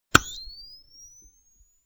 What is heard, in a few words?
flash